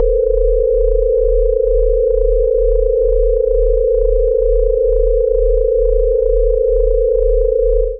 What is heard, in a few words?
Split Chromatic Clusters C0-C4; 128 bars; Drone; MIDI Clips; Ableton; Drone Lab; Notes and Clusters